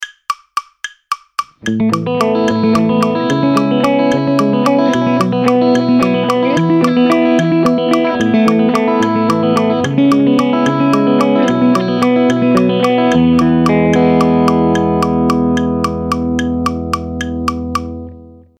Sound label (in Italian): Esercizio Hybrid picking medio anulare, guitar